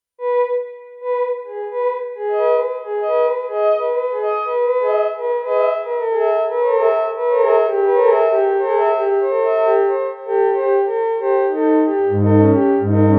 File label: Lively pulses that get slowly out of phase, Miniature Theremin; ringtones